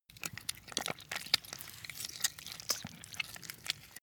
intestines handle

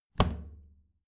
plastic trash can close heavy muted

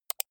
computer mouse right click fast

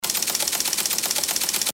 telex machine

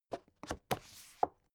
book shelve

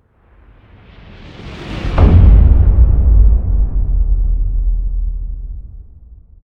whoosh brutal sub

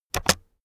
keyboard external space press fast heavy

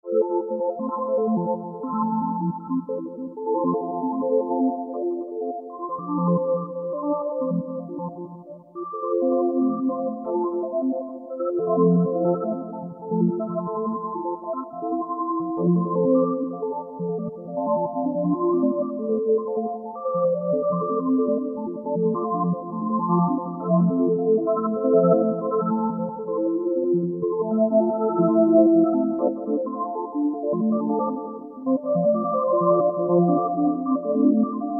space calliope

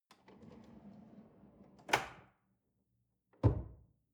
drawer large trash close lock

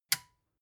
metal button release power off

